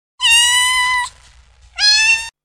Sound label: CAT MESSAGE